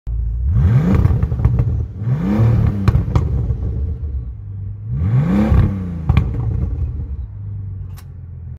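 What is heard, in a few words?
Bentley, exhaust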